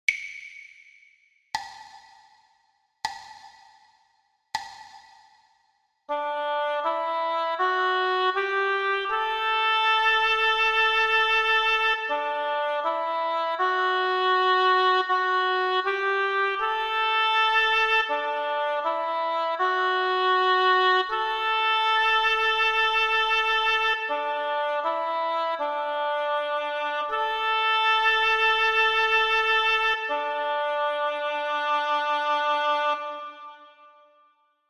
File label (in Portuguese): percepcao ditado